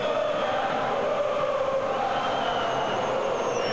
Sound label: CHANT; swos-soundset